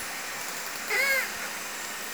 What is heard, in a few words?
call, L pod, SRKW orca; Alwin